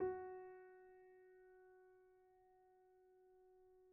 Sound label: F#4, Grand Piano; bitKlavier Grand; Piano Bar